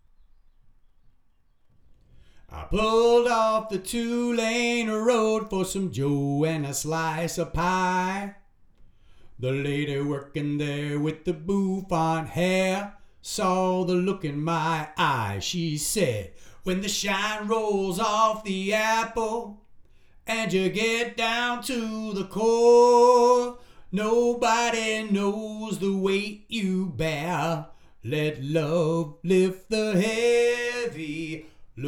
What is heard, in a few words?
vocals